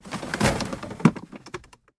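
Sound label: luggage being taken movement; zvuki chemodana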